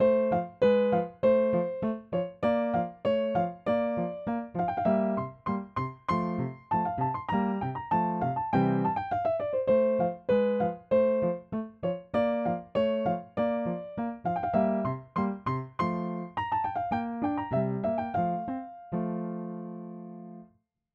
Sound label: Closed Lid Narrow Stereo Bluethner